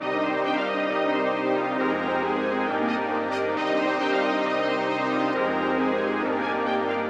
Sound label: C min 135 BPM